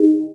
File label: join game; RA2YRMO Simplified Chinese